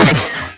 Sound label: shld hit; Privateer